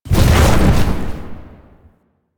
Horse Glide Wings Retract; Vehicles; Duviri

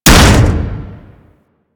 Hammer Impact Default E; Warframe; Weapons

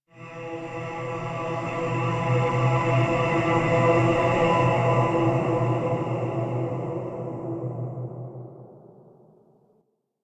Rand Chant E; Warframe; DE; Hubs; Syndicate Arbiters; Tenno

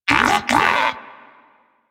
Moa Pet Agree; Warframe; Pets; Tenacious

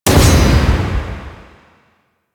Psychic Bolts Attach; Warframe; Nyx

